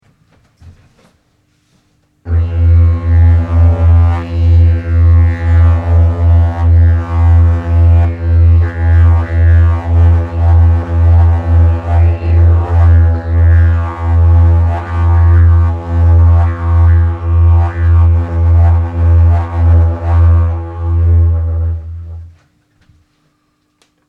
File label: Binaural Didjeridoo MI